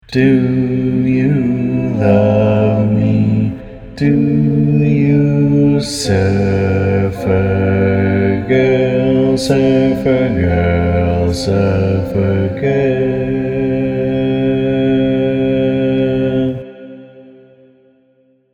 Bass, Barbershop; Each recording below is part predominant - one part louder, other parts quieter.; Arranger / Paul Paddock / Year: 2024

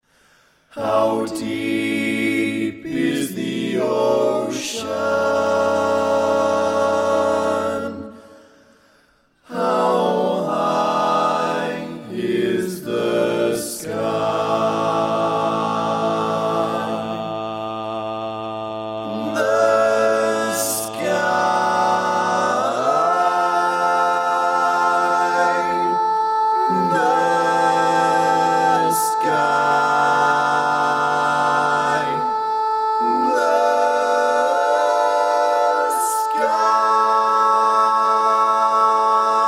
Lead, barbershop

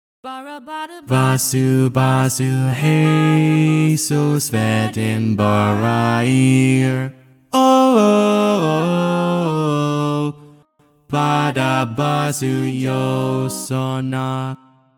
SATB Bass, barbershop tags; Each recording below is part predominant - one part louder, other parts quieter.

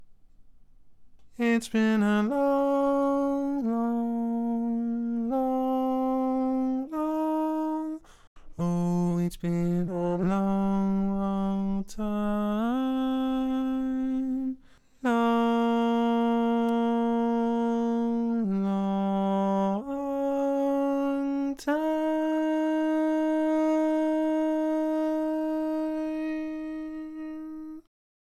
Bari, Barbershop Tags

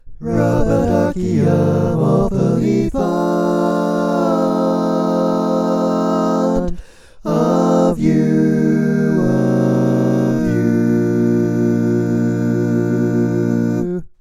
All Parts, barbershop

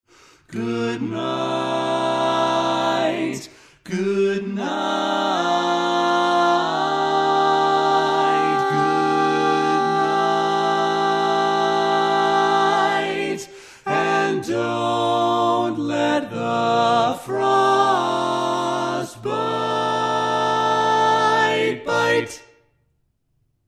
Tenor, barbershop tags

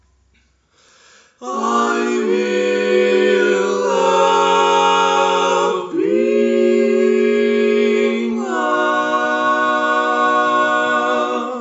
barbershop tags

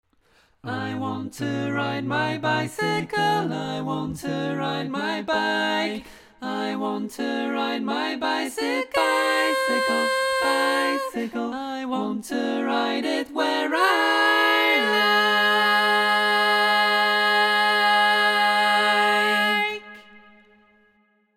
Lead

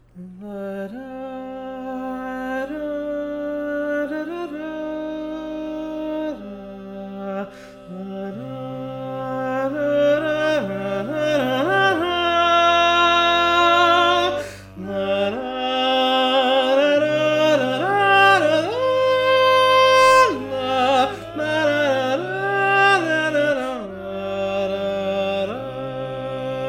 Lead, barbershop tag

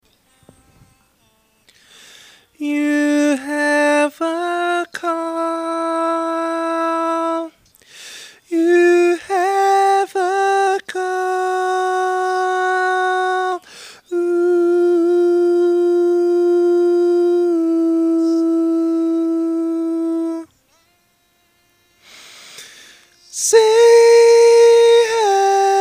Tenor, barbershop

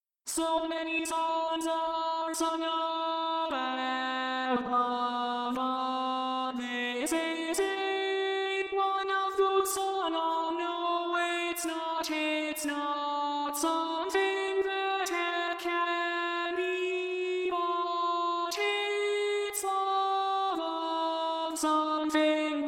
Tenor, barbershop

